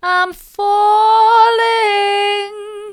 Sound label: i'm falling mid, vocal